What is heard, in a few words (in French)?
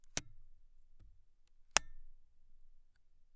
OUVERTURE-FERMETURE FLASH